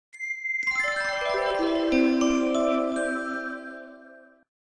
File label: pantech breakout shutdown